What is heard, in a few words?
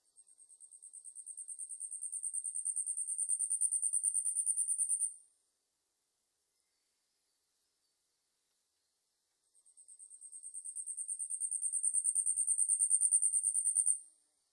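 yabusame, Asian stubtail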